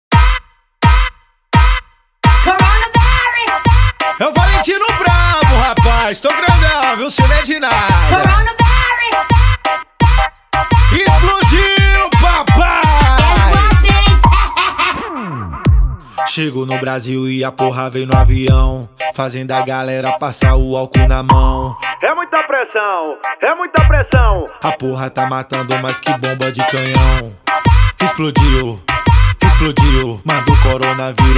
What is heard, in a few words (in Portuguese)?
brega funk; corona virus